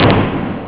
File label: whack, drums misc; OctaMED SoundStudio V1